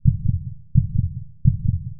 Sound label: heartbeat; cstrike